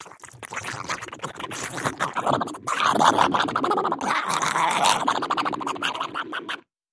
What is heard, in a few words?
Gross Mouth Mvmt, chewing & eating